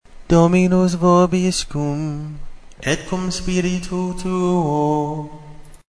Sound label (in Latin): Dominus vobiscum Tonus simplex, chant